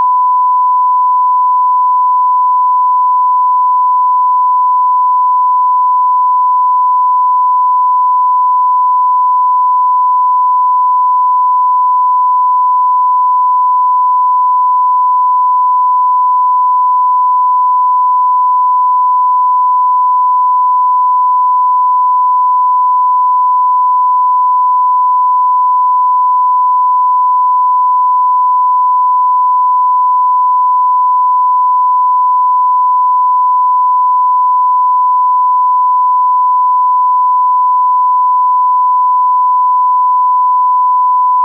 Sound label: 1khz left channel